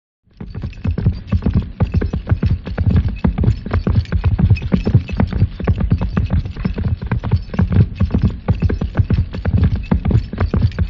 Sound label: Constant Horse Cante